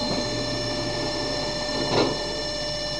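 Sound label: Door Close, Train; KCSV7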